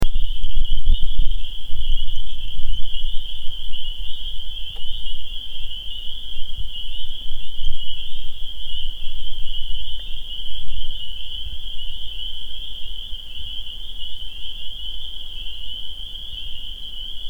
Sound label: chirpers; Rhythmic Patterns in New England Landscapes